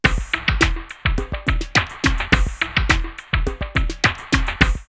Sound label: Slow Samba 105, drumloops; X-Static Goldmine